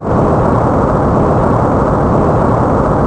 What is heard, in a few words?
breakdown no fade